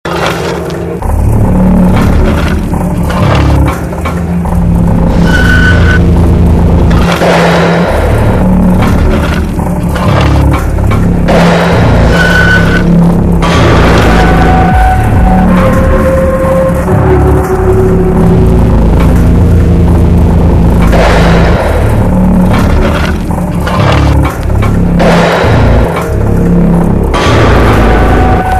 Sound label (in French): matin Les oiseaux reviennent